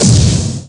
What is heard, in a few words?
Vanish Disappear; Sheik; Zelda